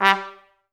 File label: TRS STAC G2; Best Service; Peter Siedlaczek's Advanced Orchestra